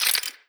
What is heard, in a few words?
click